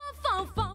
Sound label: fon fon strong